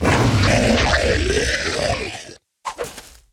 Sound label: Hellhound Attack; Puzzle Chronicles; PS3; PSP; X360